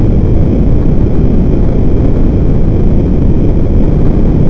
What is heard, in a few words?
falls